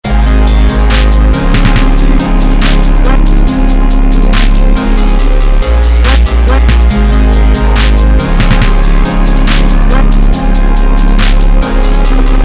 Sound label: trap kit; tamir x orin